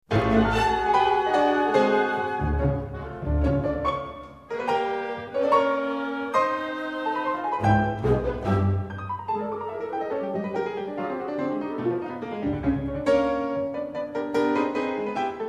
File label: Concerto for Piano and Chamber Orchest